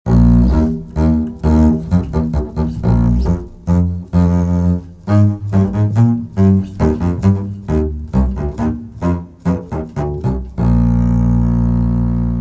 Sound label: double bass; musical instruments